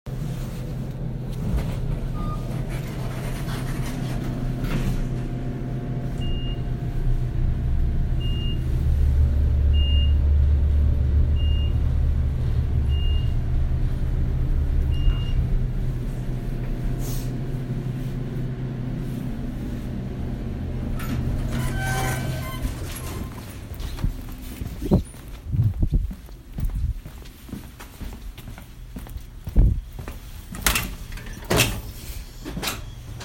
Elevator

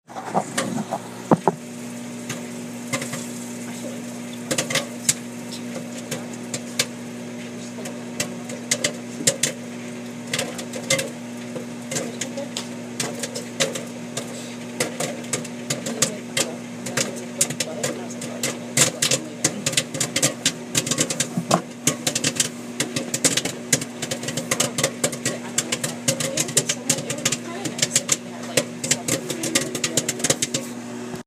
Popcorn Popping; Location: AMC Movie Theater Roosevelt Field Mall

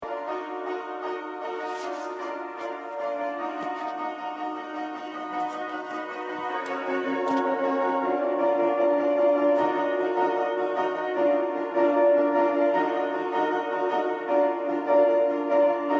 Playing Piano; Location: Adams Playhouse

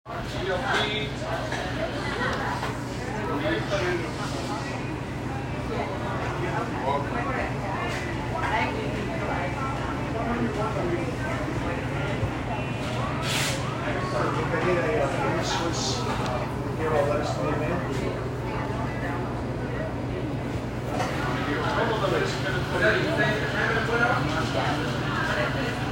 Deli; Field Recording Piccolos Deli

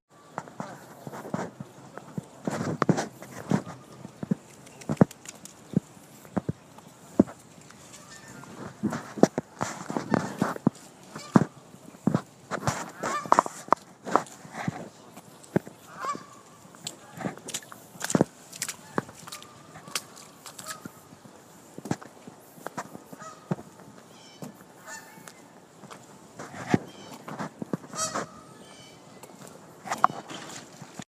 Field Recording Geese And Stuff; In a puddle in the Netherlands Parking lot

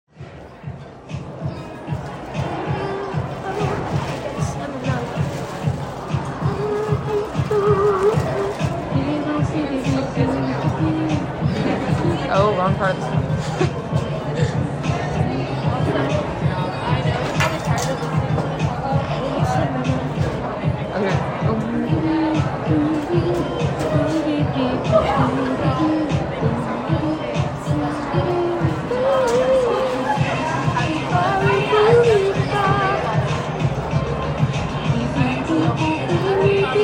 Starbucks; Field recording

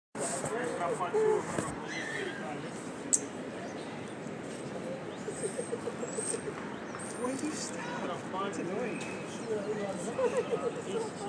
Central Park

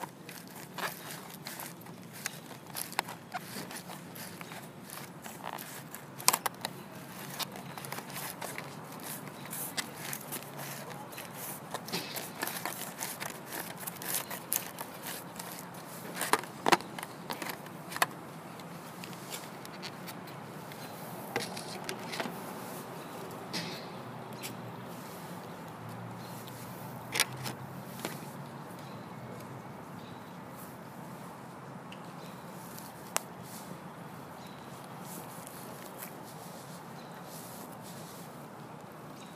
Outside; Grass outside Bits and Bytes

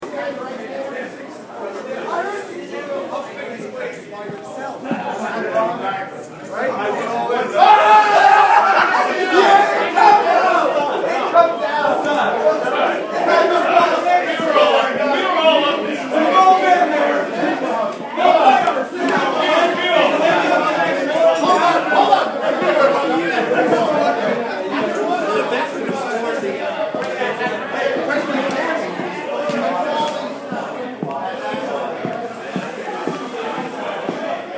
Bar Walk Around, bar

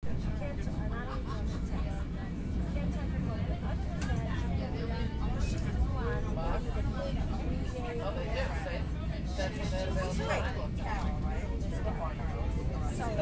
Train Home

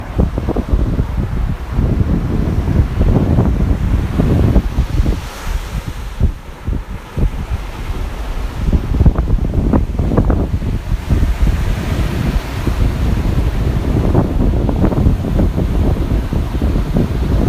Waves; Location: Shore of Long Beach, New York; cold

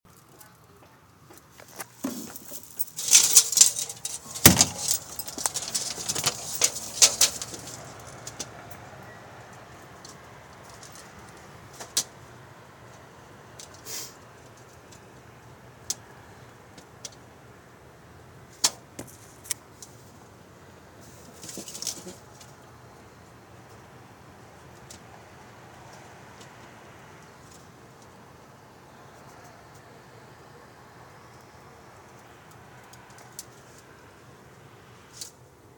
recording, window; Open Window – March 14th – 7:00pm

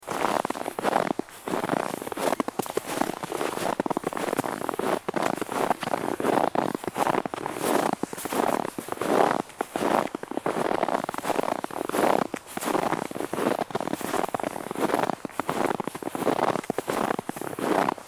Walking in the Snow

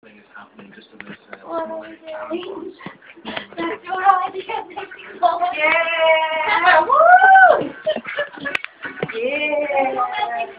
Royal Wedding, My dorm, Sounds heard: Music; laughter, dancing

